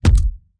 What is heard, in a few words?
Mech Footstep Base